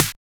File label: snare drum; foxdot default